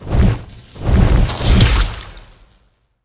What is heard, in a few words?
Boss Lighting Horse; monster